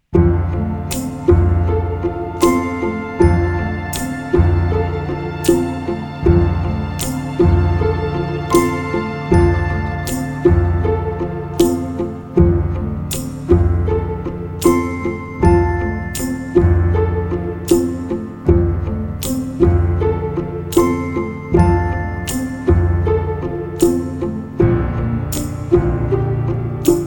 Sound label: Ending credits major chord just music